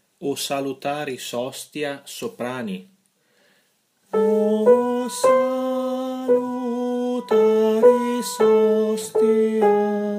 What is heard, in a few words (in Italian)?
Soprani; O Salutaris hostia